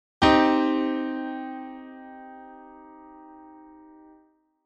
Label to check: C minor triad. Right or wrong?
Right